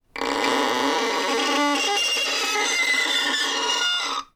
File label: Creaky Screetchy Noise Long; soundscrate